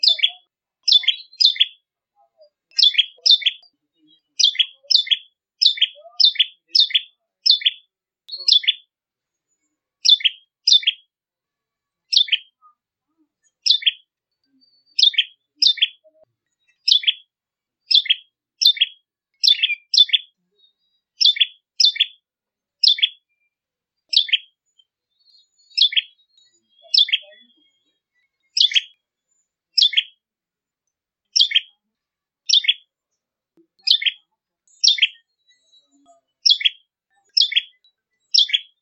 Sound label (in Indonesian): Suara Kicau Burung Ciblek Kristal Putih Full Gacor